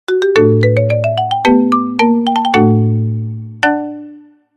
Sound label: iPhone Ringtone Remix